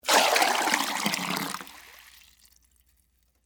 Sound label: Water Pour Plastic, From, Plastic, Container, Into, Metal, Flower, Pot; Pouring Sound Effects; BlastWave